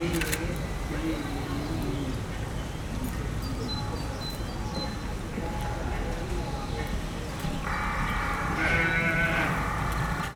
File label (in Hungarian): fotózás és varjú, juh; debreceni zoo 2012